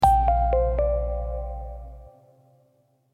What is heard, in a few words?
shut down; samsung pro